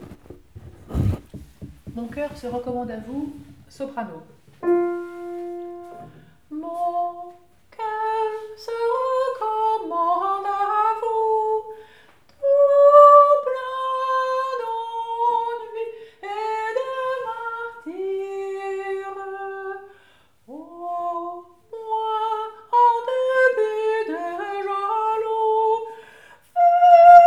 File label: Soprano; Mon coeur se recommande à vous